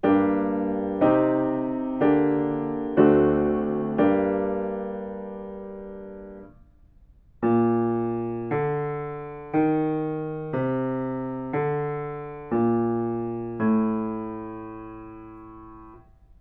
melodic fragments; ear training

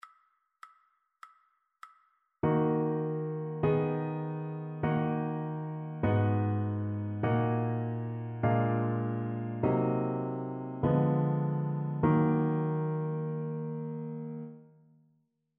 harmonic dictation

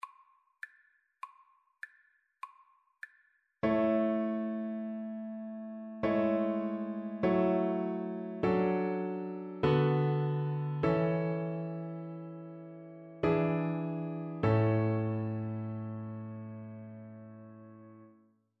harmonic dictation

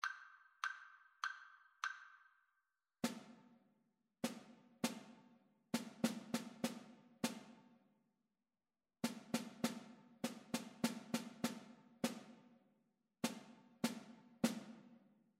rhythmic dictation; ear training

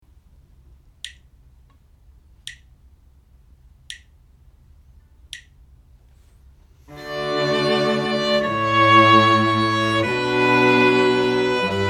harmonic error detection; ear training

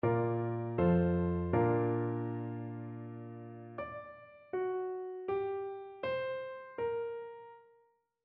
melodic fragments; ear training

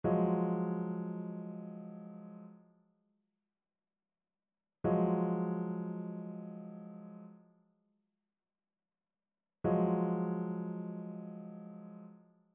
trichord; trichord identification

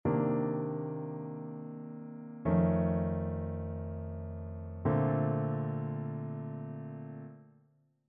ii V I progressions; ear training

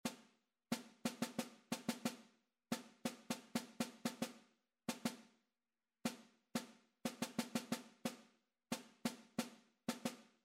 rhythmic error detection

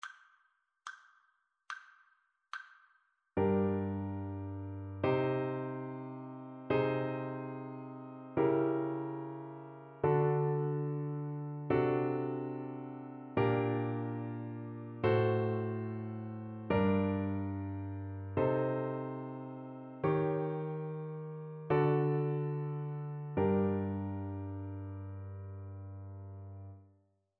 harmonic dictation; ear training

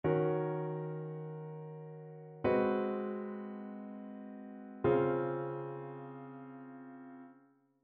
ii V I progressions; ear training

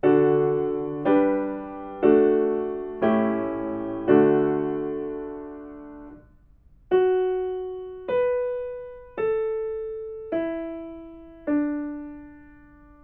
melodic fragments; ear training

